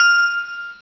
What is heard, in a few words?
Music Box; Star Trek JR Audio